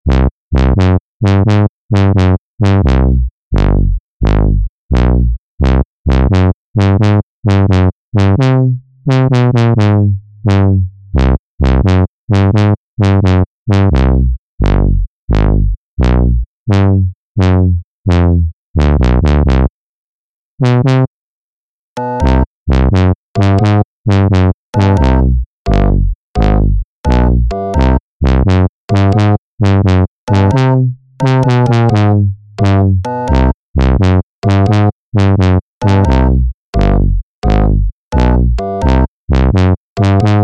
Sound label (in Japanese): ゆる系